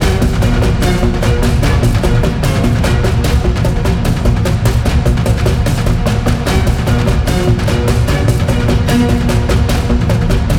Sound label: tension violin; By Burak Sound Bank